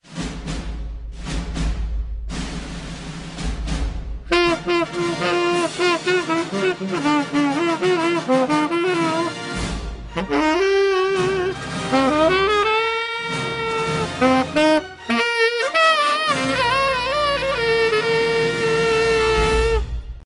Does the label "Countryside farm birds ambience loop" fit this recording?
No